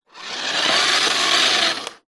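leisure radio controlled car off push carpet short fast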